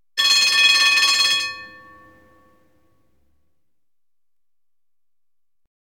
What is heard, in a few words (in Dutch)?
Trambel; KMP Film Video Sound Effects